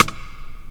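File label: rim processed, rims, fresh disco house; USB Soundscan vol.20; AKAI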